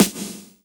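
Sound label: snare drum; Best Service Gigapack 2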